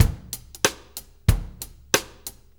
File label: Country, Drum Loops